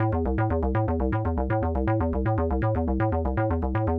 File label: SH101 Arp (120BPM), retro house